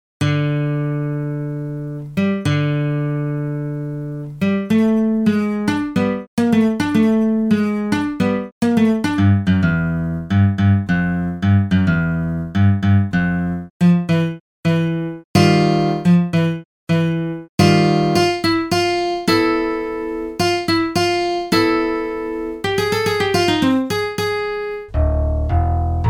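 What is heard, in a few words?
guitar ribbon patterns dry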